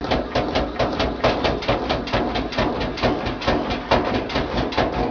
washer

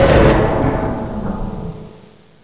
big stop

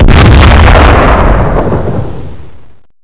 big explode

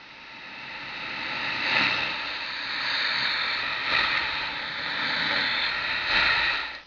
dream breathe

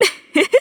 charge

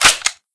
dart close

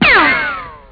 shoot

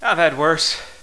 wound

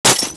bullet glass

